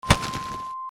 match

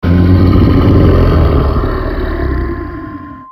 growl

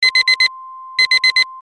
clock

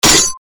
sword

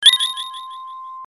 delay

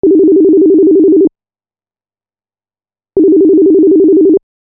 phone

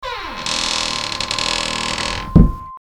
door

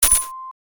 coin

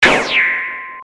gun

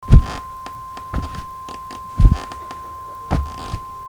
step

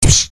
katana

